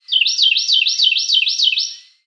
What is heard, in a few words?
bird, ambient animal